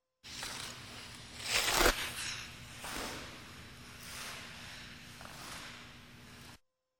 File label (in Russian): variant probega; Звуки катания на коньках